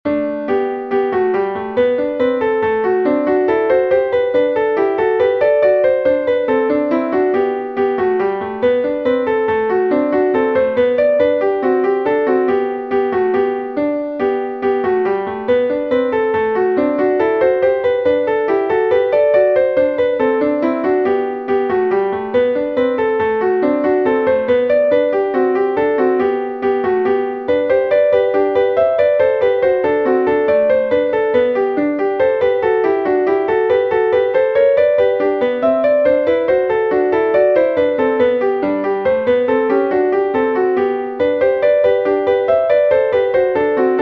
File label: March